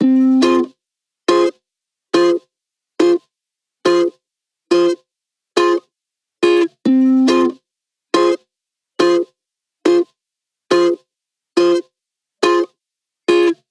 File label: 140BPM Fmin Guitar DRY, Offbeat Dub Guitars; Antidote Advent